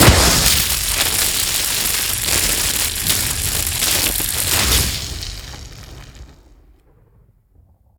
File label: lightning; skyrim